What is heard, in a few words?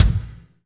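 bass drum, Roland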